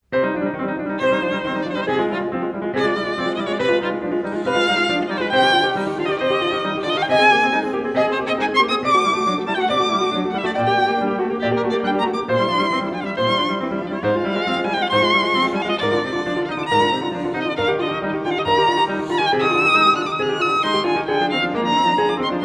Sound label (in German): Präludium c moll; Präludium Nr. 2; BWV 847